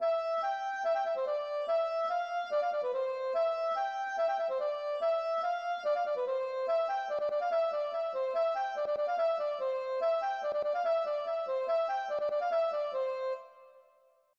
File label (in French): polka Piquee